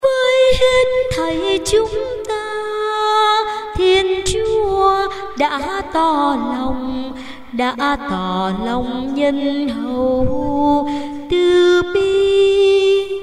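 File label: soprano tenor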